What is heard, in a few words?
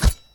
Knife Tile Hit